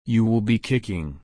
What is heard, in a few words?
kick